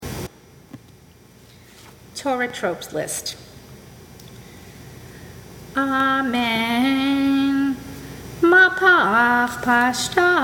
torah trope

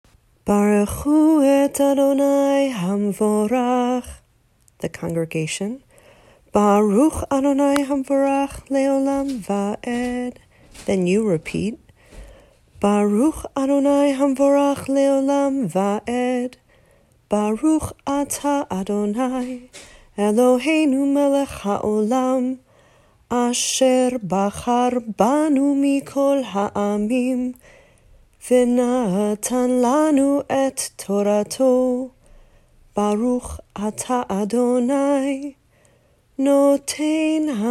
blessing before reading torah; Bnai Mitzvah Resources; Prayers

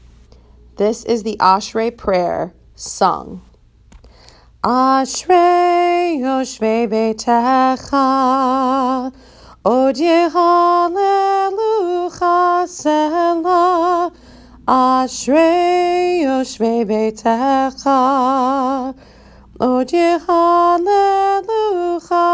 Ashrei sung; B Mitzvah; Afternoon Prayer Recordings

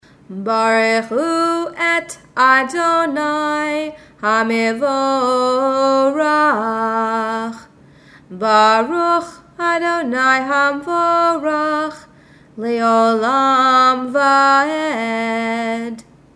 Barechu; Prayers

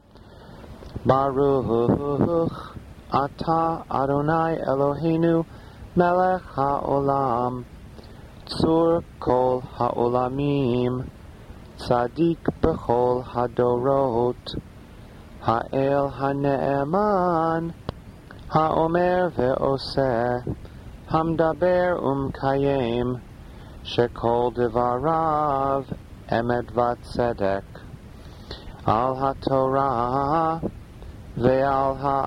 haftarah blessing after rosh hashanah weekday; worship

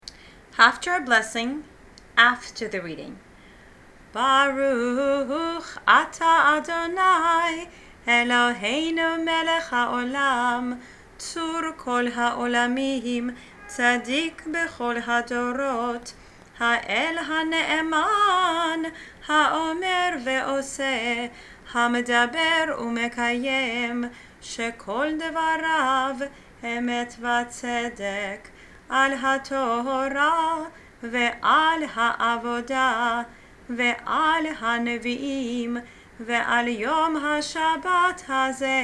blessing after the haftarah reading; bnei mitzvah

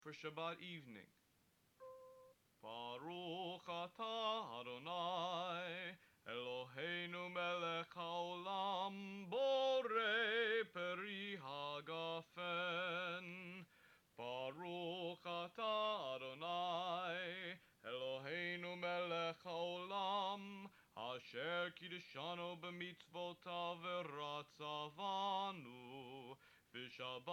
kiddush

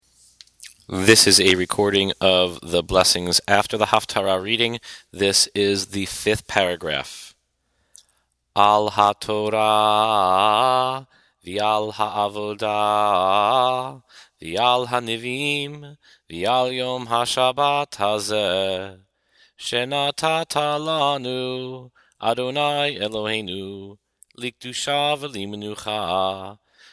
haftarah blessings after reading; Al Hatorah

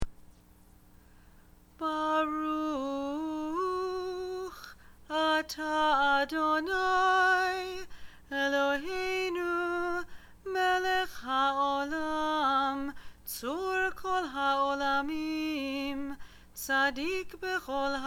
Blessing After Haftarah

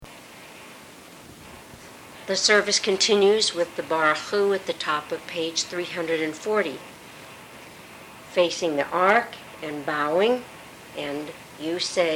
barchu; Shabbat Service

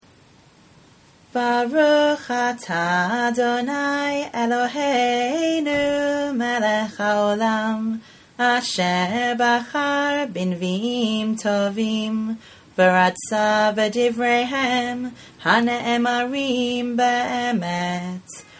Blessing Before Haftarah

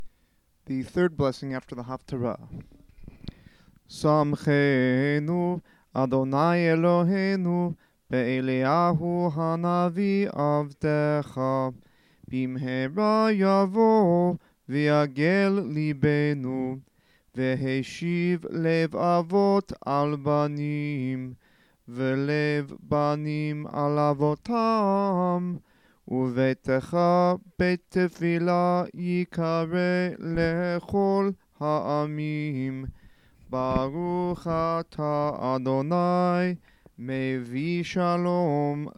blessing after haftarah

